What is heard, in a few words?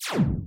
holes enter; smbx2; Seta's NPC Pack